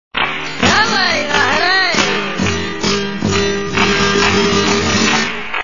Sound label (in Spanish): buleria SLOW